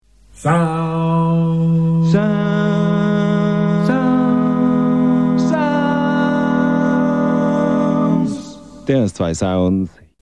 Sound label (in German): Dreiklang; radio